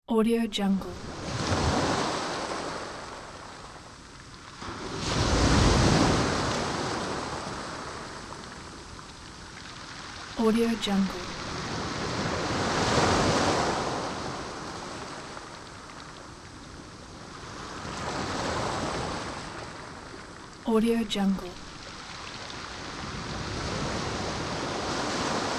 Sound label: tranquil ocean waves